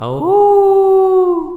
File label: wolf howling